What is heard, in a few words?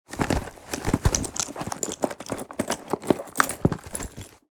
Leather purse searching and rummaging; foley